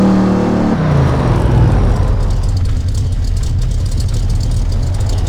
slowdown slow, vehicles cars; ford mustang foxbody; lwcars

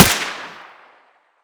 fire suppressed, weapons; cw l85a2

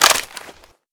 mag out, weapons; cw l85a2

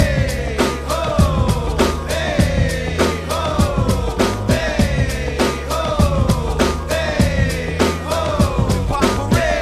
hurrah music loop; actmod; fortnite; emote

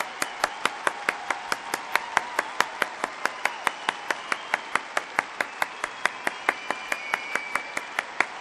emote comms claps loop; actmod; fortnite

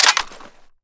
cover open, m249; weapons; cw m249

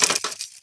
bullet in, weapons; cw m249

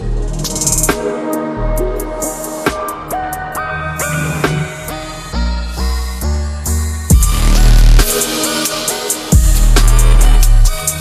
alien music; actmod; fortnite; emote

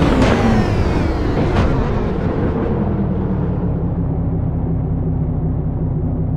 slowdown slow, vehicles cars; lwcars

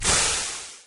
cooldown; zrush

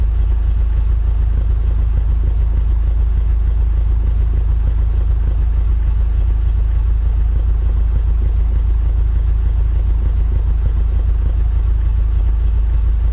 idle, vehicles cars; lwcars; mer c63 amg

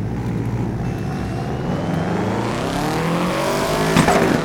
slow, vehicles cars; subaru BRZ; lwcars

